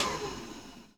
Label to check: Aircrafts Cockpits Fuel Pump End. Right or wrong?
Right